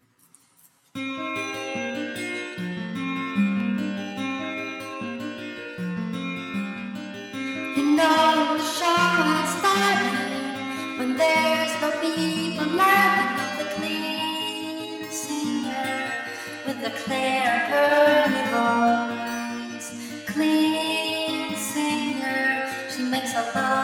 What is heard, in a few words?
Clean Singer